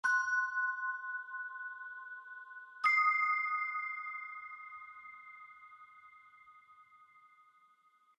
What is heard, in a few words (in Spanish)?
ding dong sueño